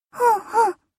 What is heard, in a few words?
hmhm